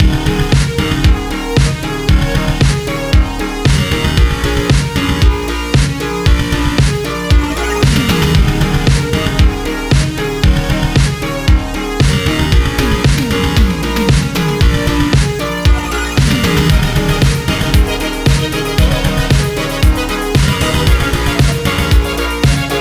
Music Boss Close Up Sword Fight Loopable 115; Wise The Wise

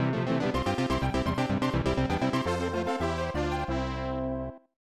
victory

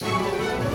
Music Segments Fight Joyfull Theme Bpm160 Start; asterix obelix slap them all 2

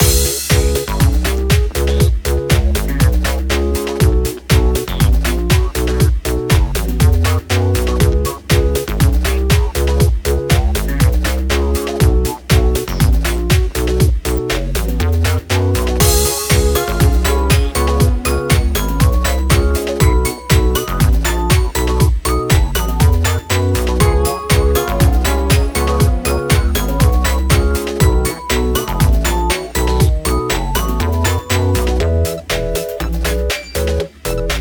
Low Beat; A-ka Best Complete Sound Box 2013-2017; ブレイクスルー

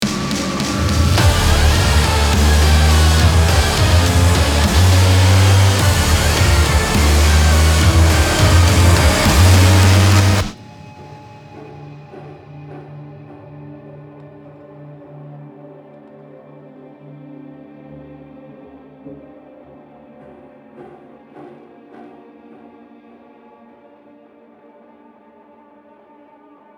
victory

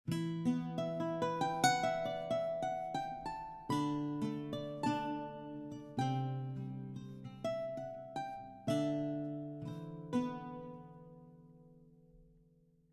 baroque guitar; Quivr; PS4 Windows gamerip 2016

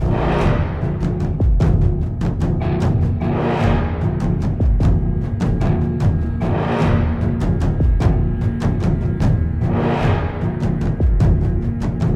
Battle Loop; Infinity Blade 3

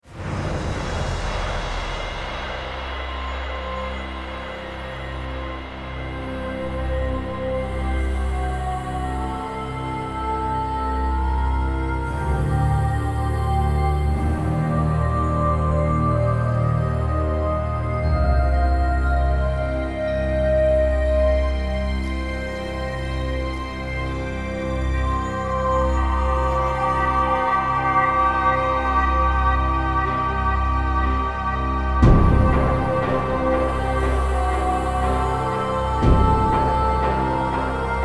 Cutscene Jingle; Infernal; xbox 360

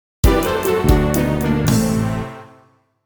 Balloon Float Castle - Lose; Mini Mario & Friends: amiibo Challenge

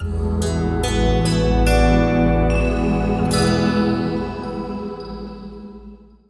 Act III Stinger; Gordian Quest

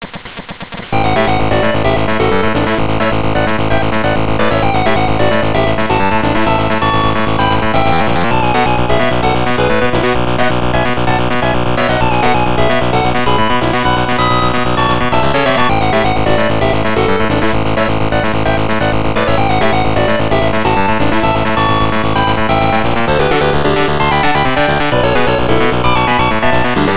Overall Victory (Champion BGM); World Circuit Series; GB

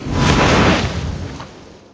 Powerup firewall

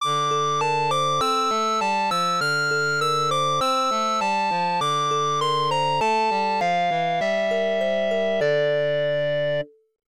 lose; nobunaga's ambition sengoku gunyuuden; pc-88

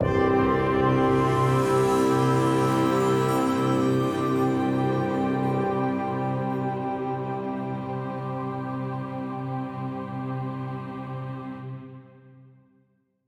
Tension - Relic Stinger

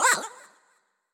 Bark Damage

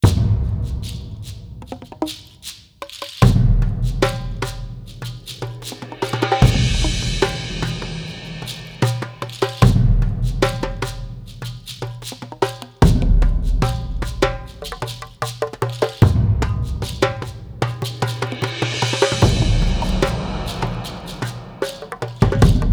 Perc Egyptians

